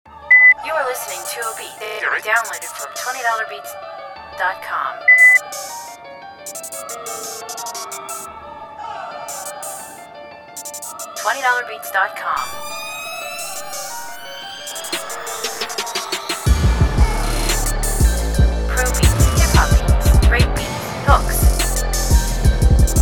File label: Hip-Hop